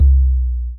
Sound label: SNGL.BASS, Jungle Rave